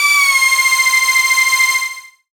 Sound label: synth c, Dance Synths, Juno; ProSamples vol.37; Juno Jam; Best Service